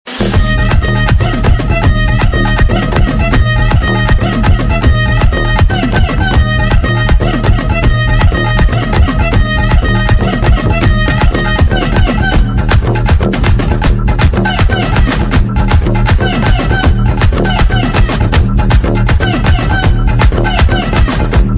climax fight scene bgm ringtone; maaveeran

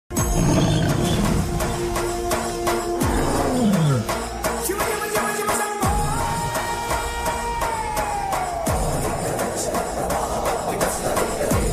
title bgm dolby surround 5.1; Akhanda; akhanda bgm series

